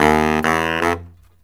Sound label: BARYT, Killer Brass Riffs